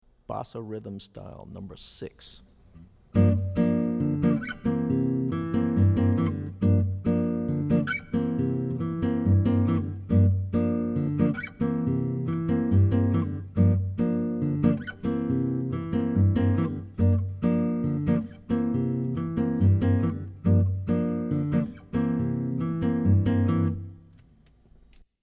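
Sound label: Bossa Rhythm Style, guitar; jazz chording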